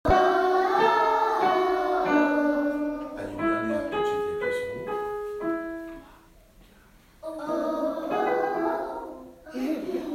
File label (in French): Echauffement des voix